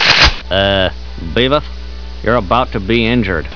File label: reload; quake; fortress